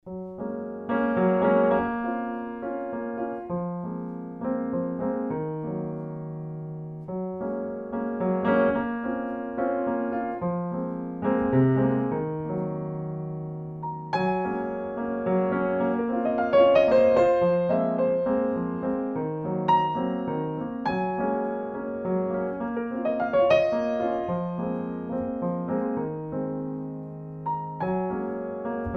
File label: MELANCOLIC WALTZ